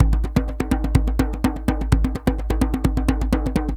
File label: DJEMBE GROOV; Best Service; World Colours